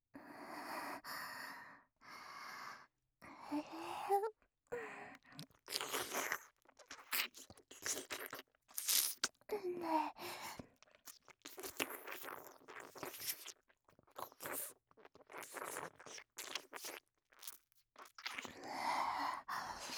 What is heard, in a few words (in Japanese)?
おまけ（リュカの耳舐め）